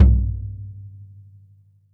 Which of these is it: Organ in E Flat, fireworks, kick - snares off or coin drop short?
kick - snares off